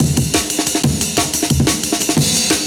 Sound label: Classic, Breakbeats; Polyend; Stazma & Concrete Collage; Amen